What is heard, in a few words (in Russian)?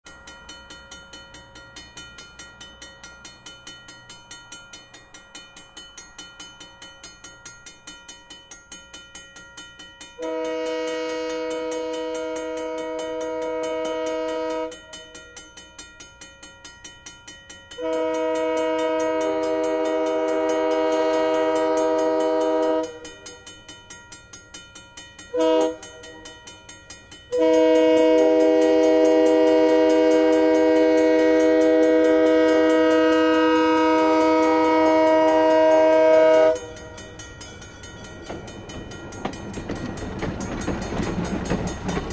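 zvuki zheleznodorozhnogo pereezda, railway crossing sounds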